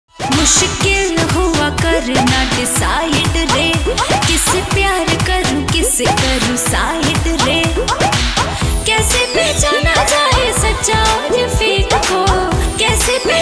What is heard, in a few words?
bhojpuri ringtone